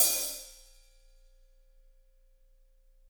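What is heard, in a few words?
hi-hat, Drums; Bob Clearmountain Drums I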